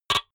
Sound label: typewriter single